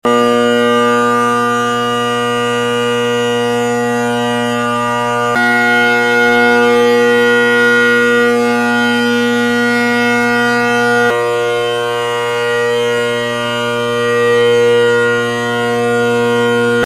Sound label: cane; Naill, Robertson, then Sinclair with Cane tenors